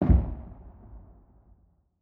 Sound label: beancan grenade explosion distant; Rust